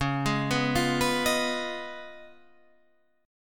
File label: Db 9th Db down Guitar Standard; arpeggiated; JGuitar